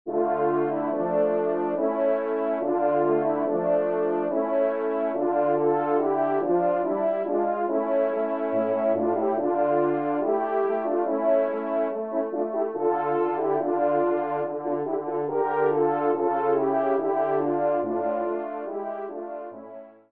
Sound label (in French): Trio Cors; Jean-Joseph Kenn (1757-1840); Trio de Cors ou de Trompes de chasse